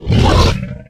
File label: flesh attack, monsters